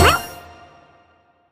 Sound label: wild land; netent; copycats